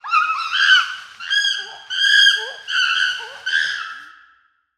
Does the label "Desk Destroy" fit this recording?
No